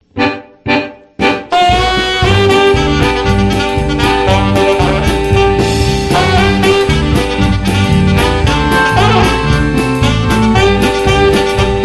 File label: sax